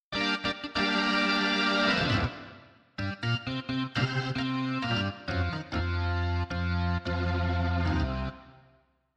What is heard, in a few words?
heavy distorted